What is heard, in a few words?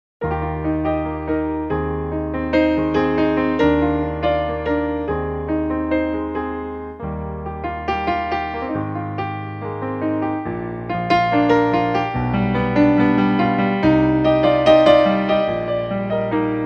piano solo